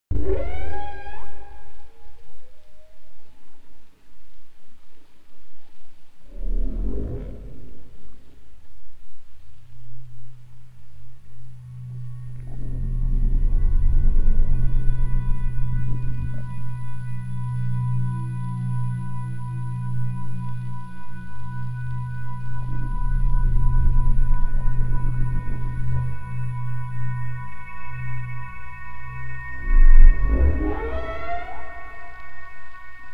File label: Deep Whale Adagio (ambient)